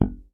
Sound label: X NOTE, FRTLSS, Bass; Sampleheads; Will Lee Bass Library; F JZZ